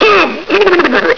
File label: jowls